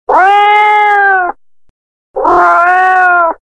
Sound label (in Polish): Odgłosy Zwierząt Miauczenie Kota